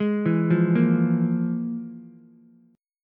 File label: F Minor Major 7th Double Flat 5th, Ukulele; FmM7bb5 arpeggiated; JGuitar